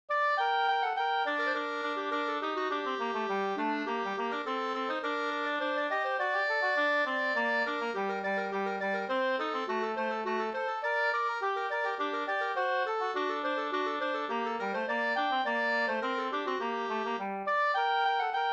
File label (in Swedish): polska Brudpolska